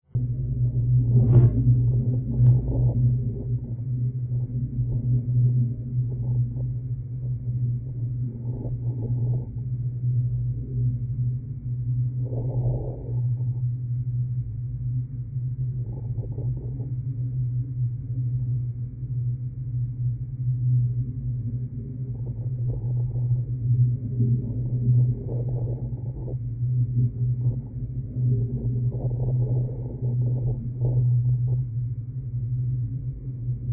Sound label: Rainbow bridge vibrations